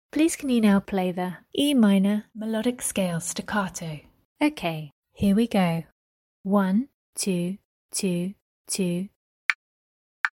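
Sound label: E minor melodic scale staccato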